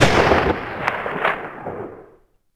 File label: mg sten ringout extra; WAR